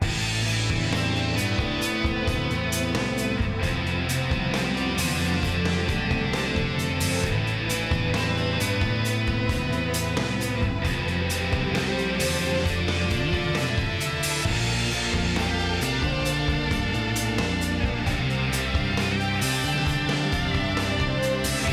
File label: Game Over; Bleach Erabareshi Tamashii